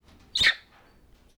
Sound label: monkeys various single chirpy squeals